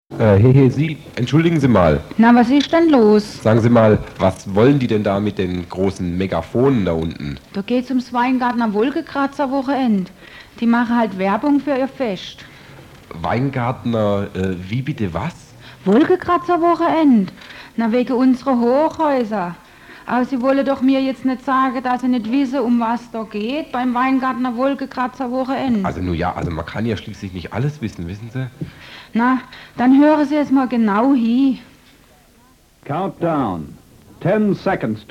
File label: jingle